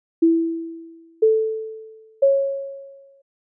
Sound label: classroom tones; classroom audio project